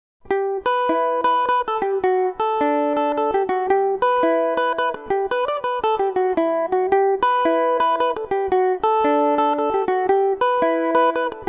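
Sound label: E dorian revelation, Irish mandolin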